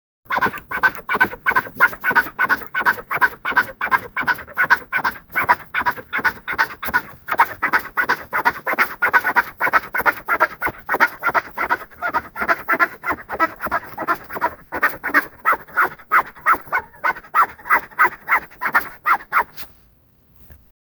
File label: duck waddling sound, waterfowl